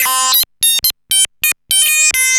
Arp E Line, ARP Odyssey; Lines; synth; musicradar-synth-samples

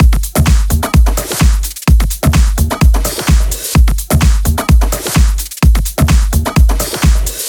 128BPM Fragile Kit; Vengeance Samples

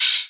Open Hihat; Vengeance Samples; VEE Cymbals

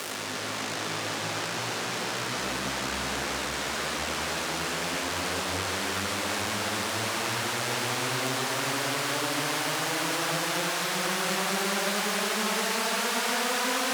128BPM Siren; FX Fills; 16+1Bars; Vengeance Samples

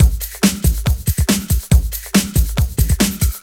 Loops BB 140, BreakBeats; Vengeance Samples

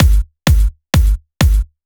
Kick, 128 BPM; Vengeance Samples; Vocal-Hook Kits; Song Kit

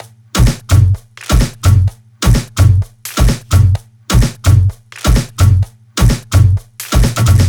128BPM Brazil Kit; Vengeance Samples

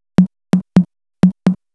Melody Kits 128BPM Root F#; Vengeance Samples

